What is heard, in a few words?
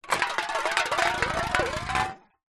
food drink soda can empty roll concrete ground